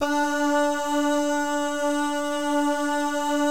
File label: "bah" guy, Vocal Jazz Fx